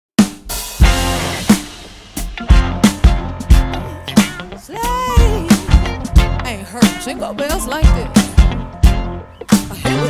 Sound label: Jingle Bells